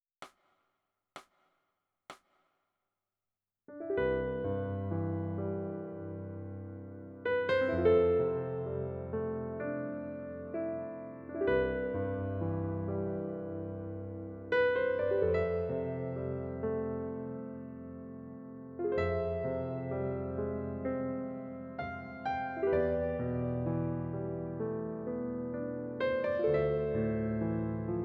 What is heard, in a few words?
Piano